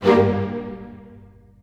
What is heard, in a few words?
str chord g min; PowerFX - Downtown Orchestra; Orchestral arrangements